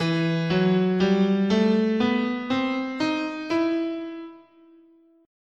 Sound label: E Harmonic Minor, scale